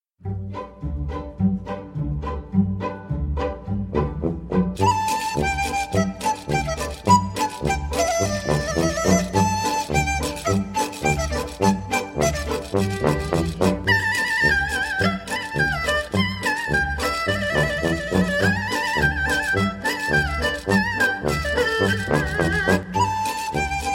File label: Flute